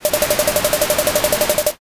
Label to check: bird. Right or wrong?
Wrong